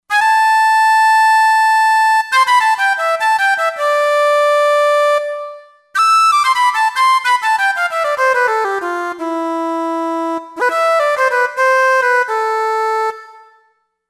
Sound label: Jaz Flute